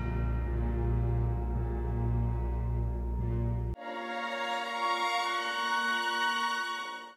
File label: strings, Sad